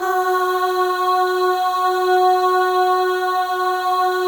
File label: Voices, FEM AHS; Spectrasonics; FEM.1 AHS